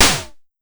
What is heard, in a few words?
Pollard Snare, Syndrum-178